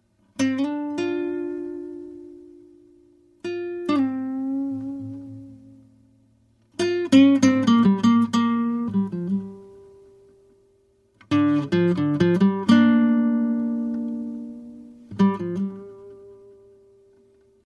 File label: compressor; orinj